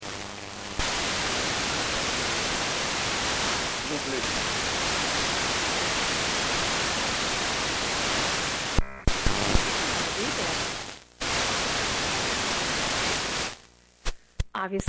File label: TV Tuning Static Snow Clicks: Television tuning; Quasar; short bursts of transmissions coming in and dropping out